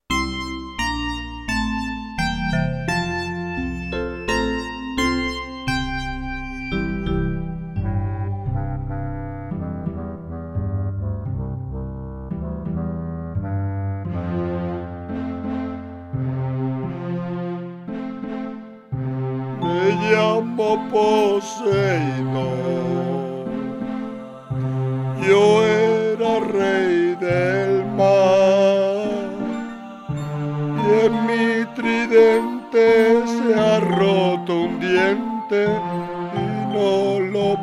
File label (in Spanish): Canción de Poseidón Cantada